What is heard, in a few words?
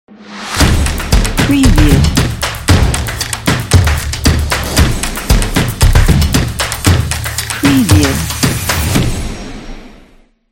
stomping logo